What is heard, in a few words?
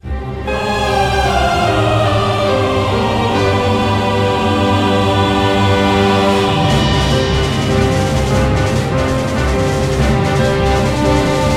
Boss Battle Intro